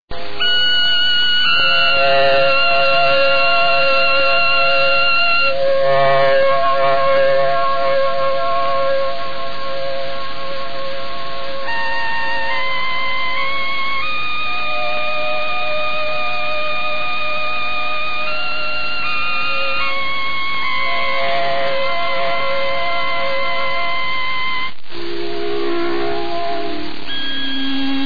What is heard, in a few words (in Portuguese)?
toque